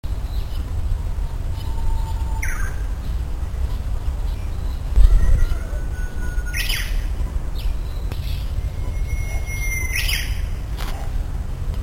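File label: uguisu, bush warbler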